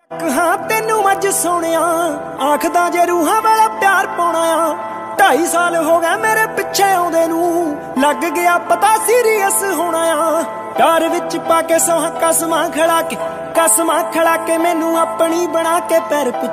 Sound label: DJ Punjabi Ringtone